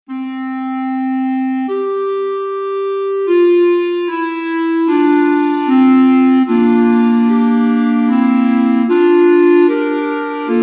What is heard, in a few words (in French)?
Trio de clarinettes